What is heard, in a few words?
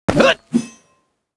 Knight M Attack